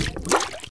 out of water; DAoC

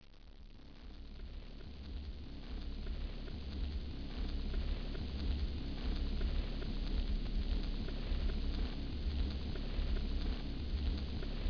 drone; Macworld (1997-11); Games World; Shareware Games; Arcade; Peng! 1.0

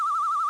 Mine; Cyclone II

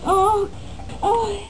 MOAN; Duke Screw'em 38D

